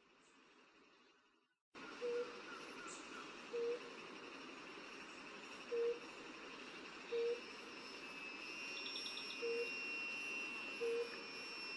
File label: Claravis pretiosa